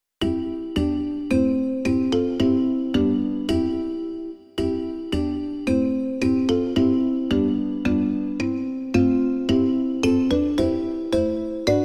kytara a xylofon; Svatý Duchu, rač v nás Pánu; Imaginativní verze

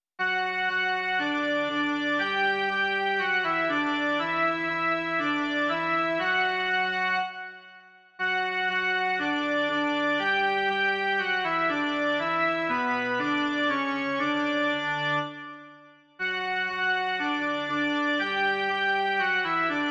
jen melodie

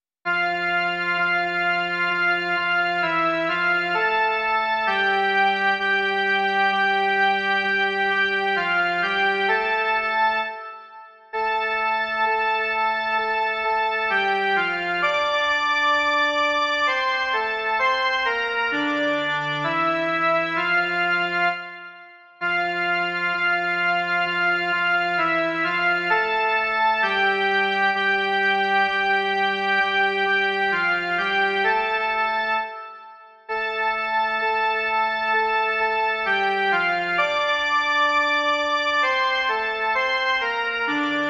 jen melodie

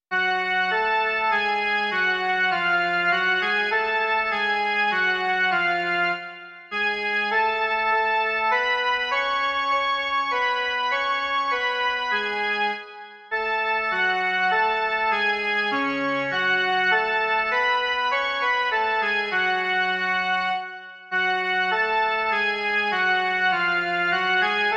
jen melodie; Beránku tichý

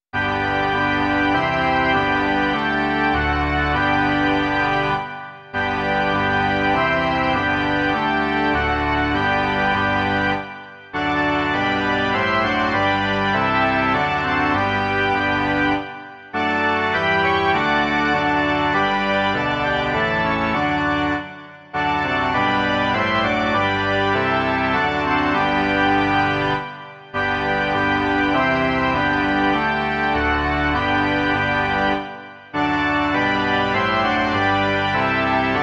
varhany; Noci tmavá, ustup zpátky

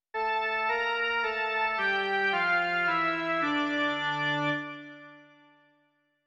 Za tvé slovo, Bože, dík - jen melodie; Doprovody Farského liturgie

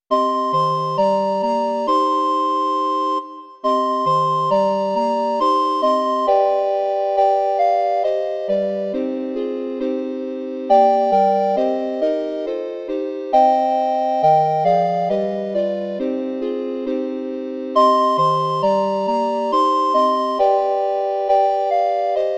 soubor zobcových fléten; Dobrý Spasiteli náš; Imaginativní verze

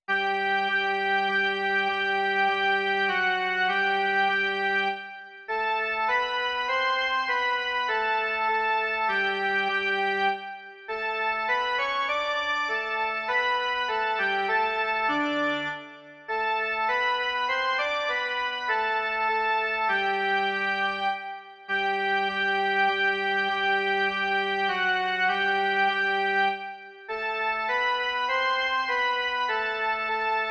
jen melodie; Noci tmavá, ustup zpátky

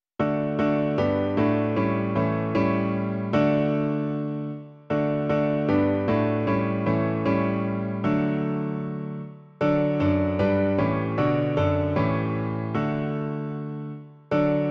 klavír; Klavírní verze

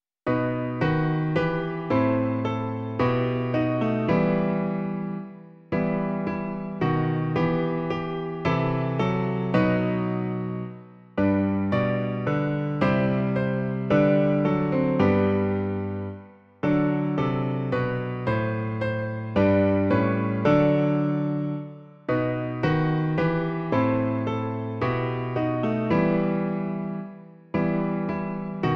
Blahoslavený Bože náš - klavír; Klavírní verze

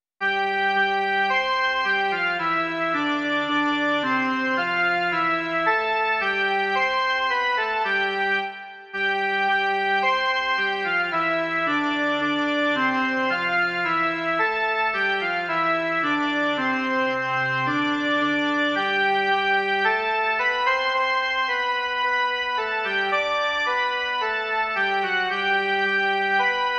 jen melodie; Svatý Duchu, rač v nás Pánu

